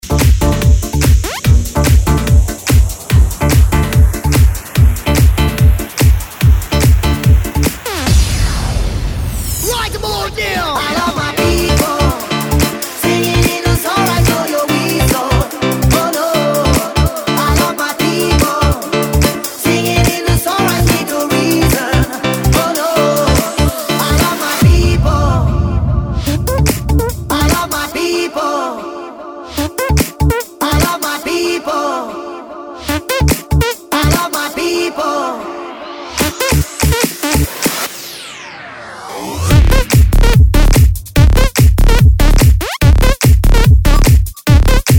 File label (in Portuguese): dance mix e nacional sernanejo pancadao e funk mix; sinop mt